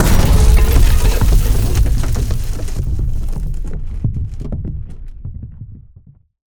howitzer falling rubble explosion impact; Bluezone; Artillery

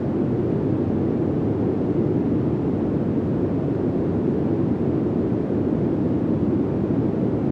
Ambient Noise, White Noise

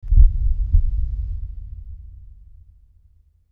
Aliens Monsters; FXHome

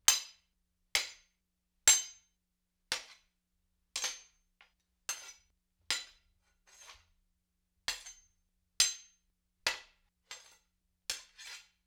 Spear Katana Blade on Blade, Weapon on Weapon; StillNorthMedia

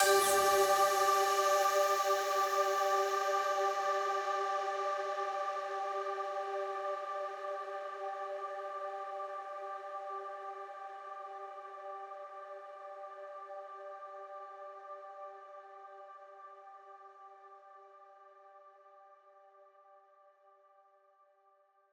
Ahh G; 99Sounds; Simply Space

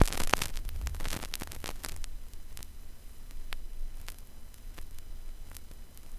vinyl crackle; 99Sounds; Vinyl Noise SFX

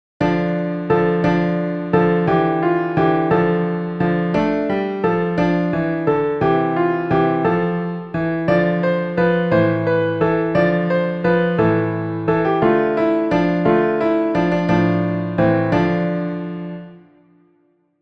Piano

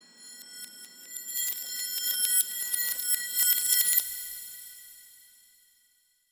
Reverse Bells; MatiasMacSD; REVERSE PRODUCTION ELEMENTS

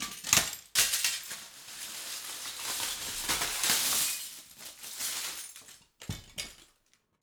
Glas Window Debris Movement, Glas Smash; The Soundcatcher; The Glas Smash Sessions

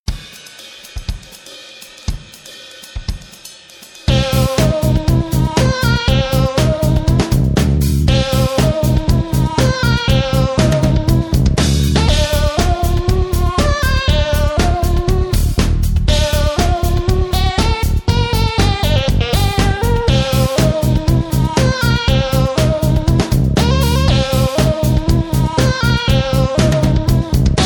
Top40

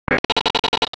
Tech, Weird Side Samples

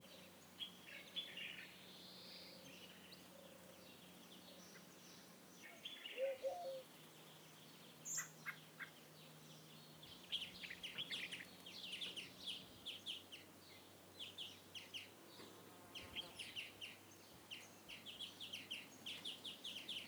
MOROCCO Essaouira Villa Birds and Foliage; Prismo Sound; Background Morocco

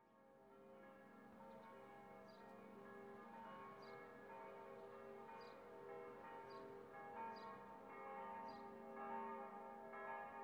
CHURCH BELLS SUNDAY CITY BIRDS TRAFFIK BALKONY; The Soundcatcher; 365 Various Sfx

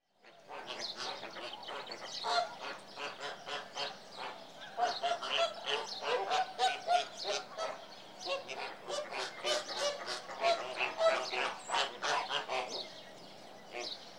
Flamingoes; Animals and Birds; BBC Sound Effects Library

